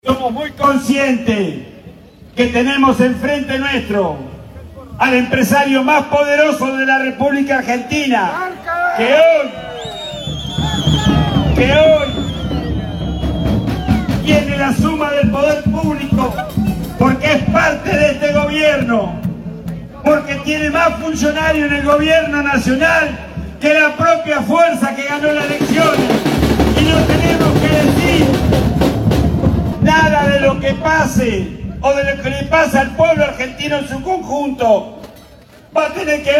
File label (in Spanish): MARCHA DIGNIDAD DE LA UOM EN CAMPANA; Abel Furlan